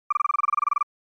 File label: SNES RPG Message